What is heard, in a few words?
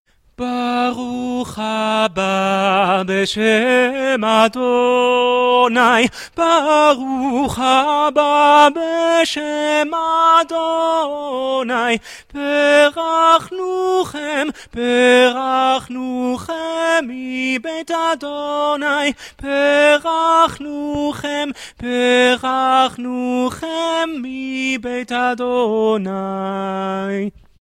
tenor, choir; Baruch Haba